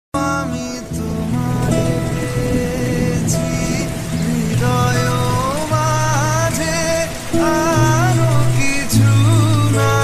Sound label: Rabindra Sangeet Ringtone Bengali; rainy day